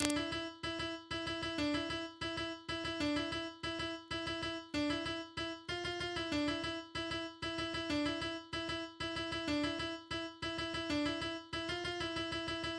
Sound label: loud indian music